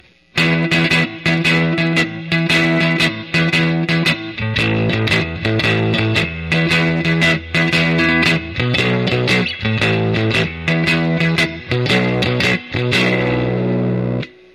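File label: Lightning Rhythm Solid State Hot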